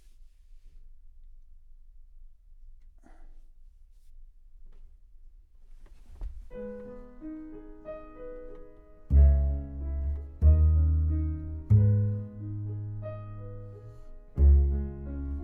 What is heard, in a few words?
CBass Bot